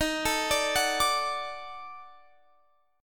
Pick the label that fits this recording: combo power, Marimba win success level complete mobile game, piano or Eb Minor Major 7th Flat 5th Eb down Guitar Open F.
Eb Minor Major 7th Flat 5th Eb down Guitar Open F